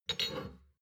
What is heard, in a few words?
shot glasses pull out slide soft; berufe; soundpool